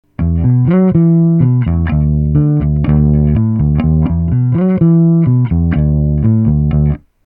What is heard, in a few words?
Rick finger